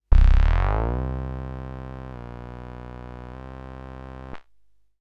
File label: wersi bass pitch mods